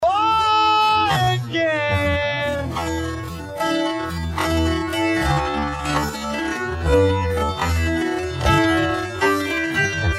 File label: PIANO SOLO